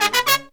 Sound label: Pop & Funk Brass